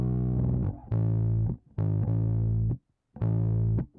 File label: bass; musdb18